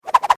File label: Foley Whip Whoosh Multiple; Whip Sound Effects; Whips (Sound Effects:Sound Design); SFX Bible 6